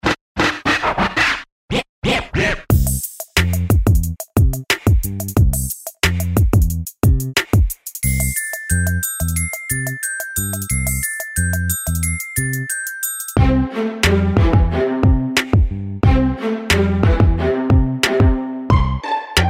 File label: instrumental